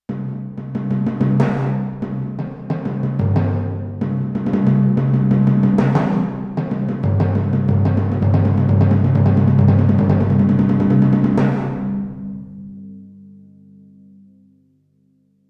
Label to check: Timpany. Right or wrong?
Right